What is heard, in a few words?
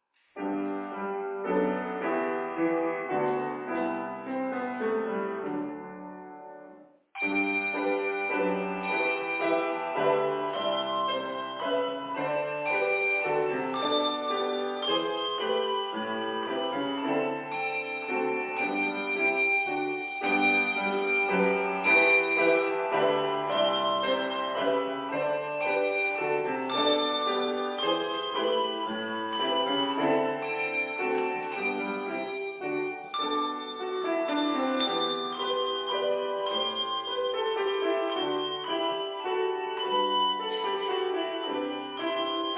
music handbell; 賛美歌メドレー; ピアノに合わせて演奏; 夏の音楽礼拝; 栗ヶ沢バプテスト教会